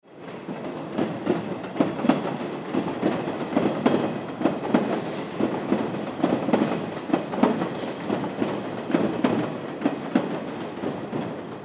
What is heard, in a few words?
train